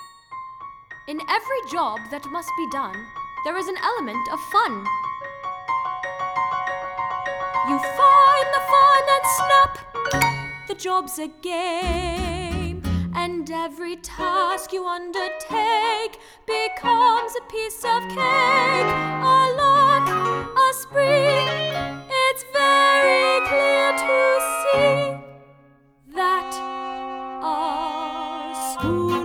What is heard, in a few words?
with Vocals